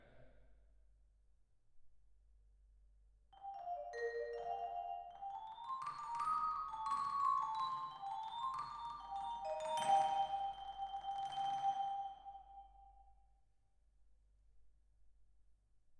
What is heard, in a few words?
Vibra